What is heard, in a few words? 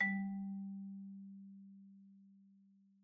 Marimba hit Outrigger G2 loud; Idiophones; Struck Idiophones